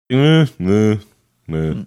making indifferent shrugging sounds

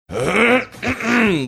clearing throat

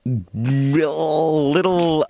throaty gurgle

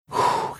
exhales

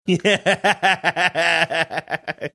laughing

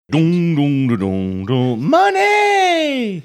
singing money

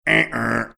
making uh oh robot sound

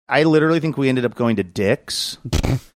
singing snorting; i literally think we ended up going to dicks

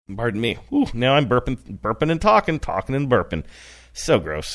pardon me ooh how I'm burping

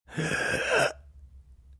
reenacting half muted sneeze

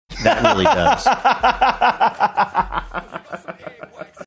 having good laugh

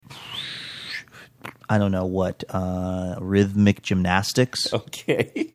mouth sounds; i dont know what rhythmic gymnastics

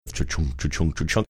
making sound train rolling along

